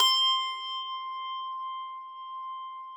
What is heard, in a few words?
Harpsichord +ped, C4; Classical Prepared Pianos; Best Service ProSamples vol.53; Total Piano